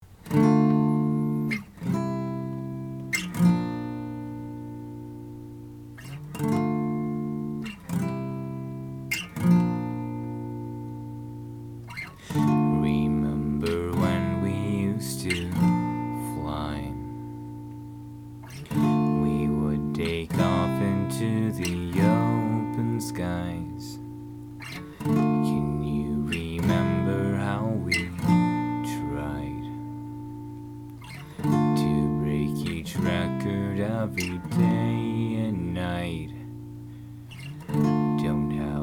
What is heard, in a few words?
(Acoustic)